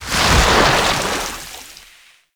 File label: Aura Ink Explosion; Gameplay; Warframe; Shadowgrapher